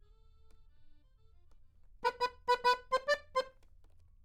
Accordion

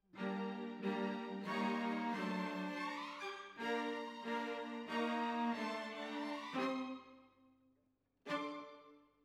Cellos Over

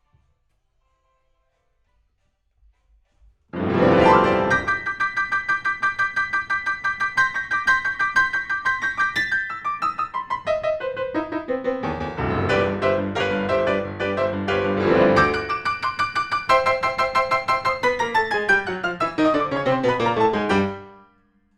PIANO, RNR for piano

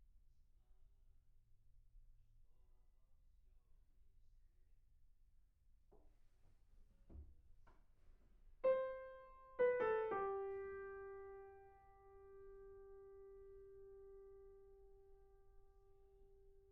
PIANO

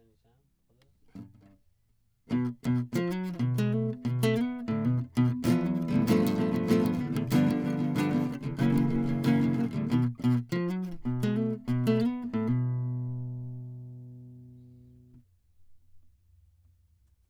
Classic Guitar

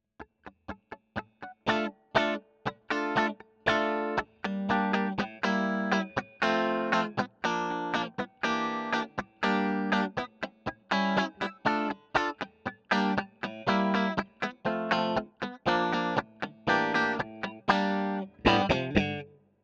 E GTR; Royer

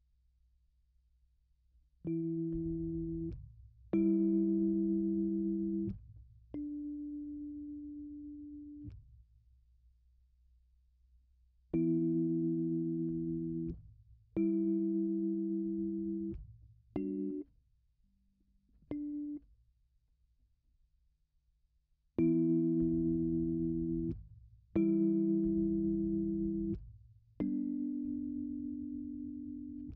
Rhodes

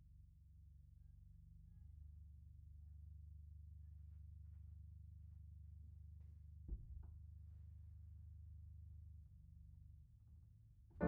Piano Mono

